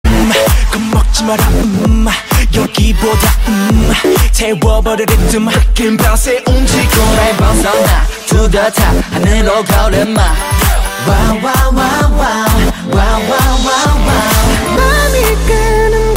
kpop

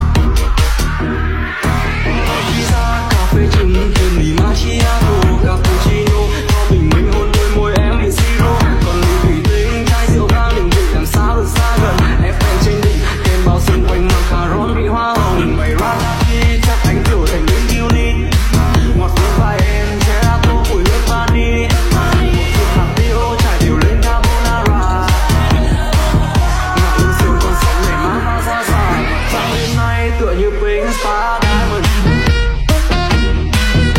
Remix

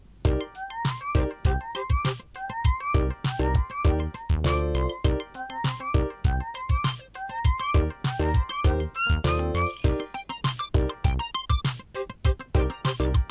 nokia jig

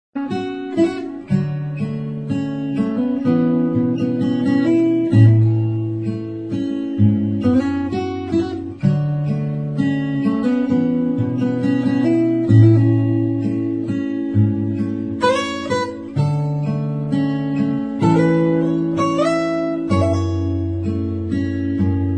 guitar

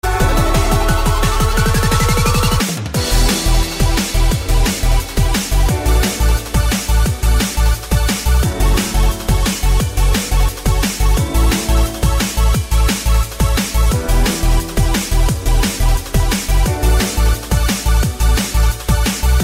nhac chuong minecraft remix

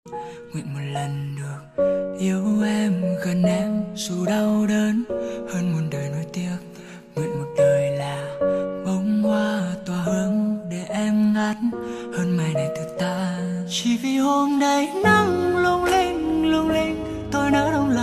lofi

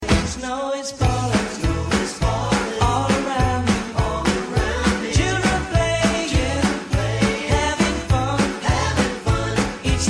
ringtone

